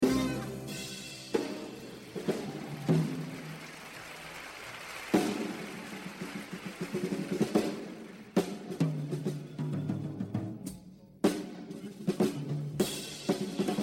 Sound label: Etude, jazz drumming; The Evolution of Jazz Drumming (Gottlieb)